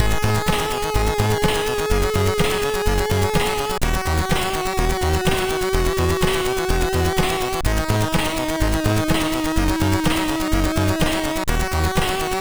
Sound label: Promos32, MOS6581R3; sid